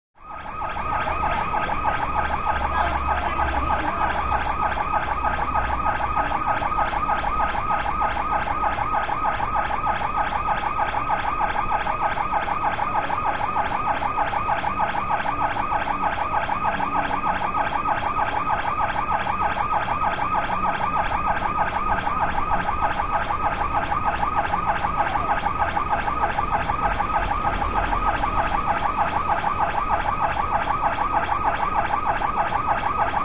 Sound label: Alarm Close Perspect